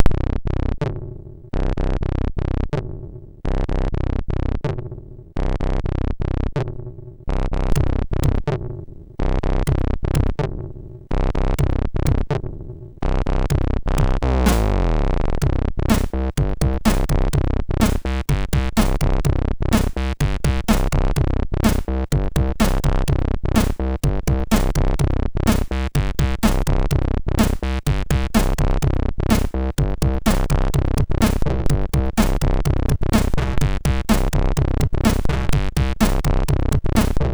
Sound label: Rave, MOS6581R3; Viadro Rave